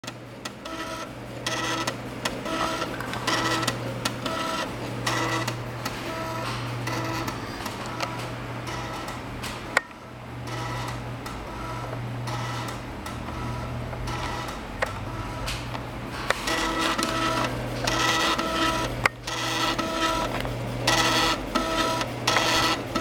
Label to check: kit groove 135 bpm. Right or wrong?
Wrong